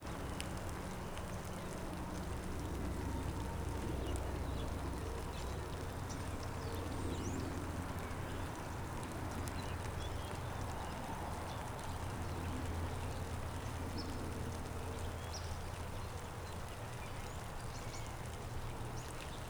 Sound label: Rain, Drizzle, Birds, Traffic